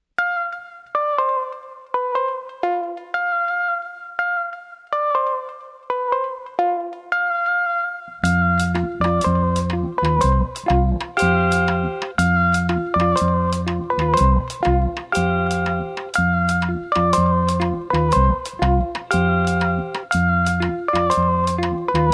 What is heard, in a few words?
Tropical Bird In Cafe